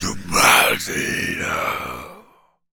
Berserk, Zombies; zps; Carrier

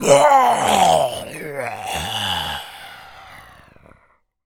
Death, Zombies; zps; Carrier

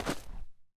sand footsteps; zps; Foley

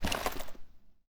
IED Holster; zps; Weapons; Explosives

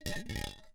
Grenade Roll; zps; Weapons; Explosives

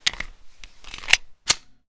USP Reload Empty; zps; Weapons; Firearms

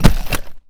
IED Drop; zps; Weapons; Explosives

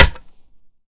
Hammer Nails; zps

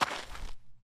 gravel, Footsteps; zps; Foley

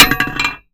Pipe Collision; zps; Foley

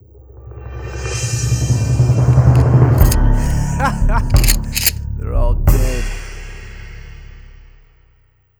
Human win, Menu; zps

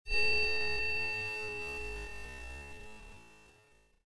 metal scrape; zps; Ambient

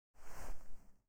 Remington 870 Holster; zps; Weapons; Firearms

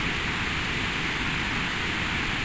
TV Static; zps; Ambient

